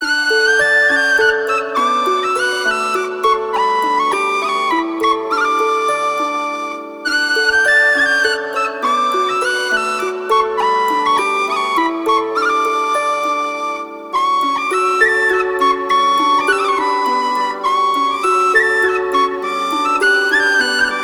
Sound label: Harvest loop; PerituneMaterial Harvest6